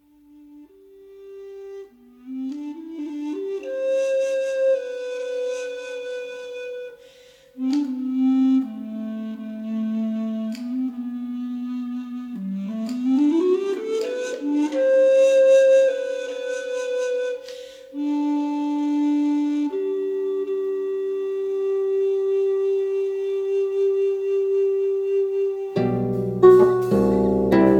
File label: BALLAD